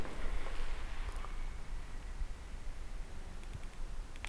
whistle

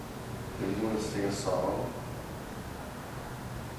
sing no

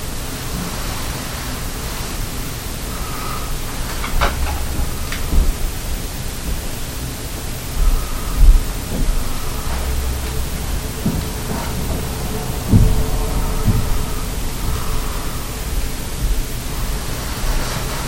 bang footsteps; Tillie Pierce

